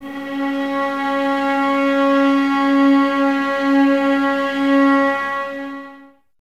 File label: STR VAS C#, Violas, No Vib; Miroslav Vitous